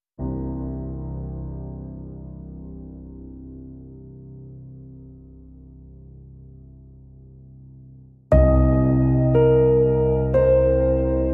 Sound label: Piano Only